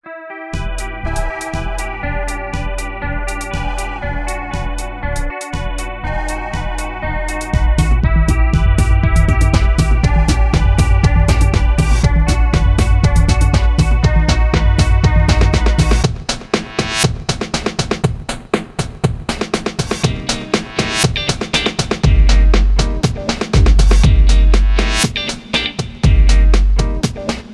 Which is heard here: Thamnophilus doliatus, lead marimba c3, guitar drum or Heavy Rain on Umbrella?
guitar drum